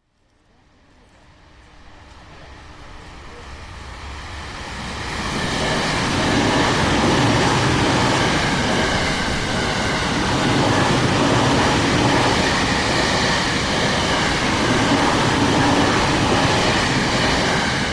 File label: bakuon, rail